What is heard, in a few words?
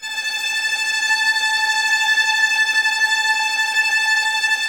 VS A 5 Tremolo, 11 Violins; Miroslav Vitous